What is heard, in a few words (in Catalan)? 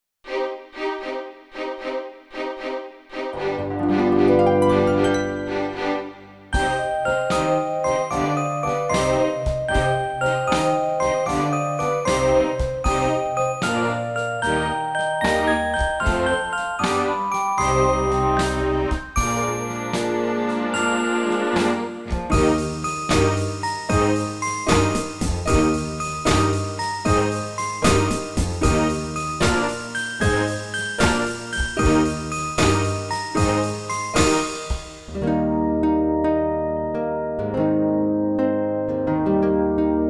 acompanyament cançó anglès